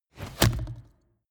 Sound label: arrow hit; soundpool